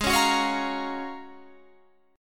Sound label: Ab 13th Suspended 4th Ab; strummed; JGuitar